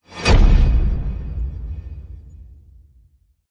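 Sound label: Hit Swoosh Reverse Steel; Brand X Music; Brand X Music Catalogue - Editor's Essentials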